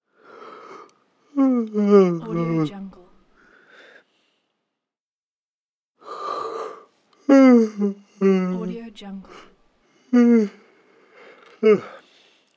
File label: man yawning